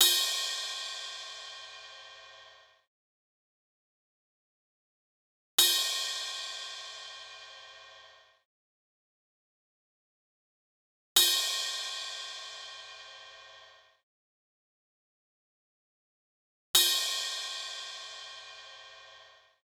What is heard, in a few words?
Cymbal